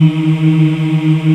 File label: VOX African; Roland LCDP11 Africa VOL-1